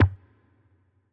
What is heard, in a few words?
B Drum; Kicks; donker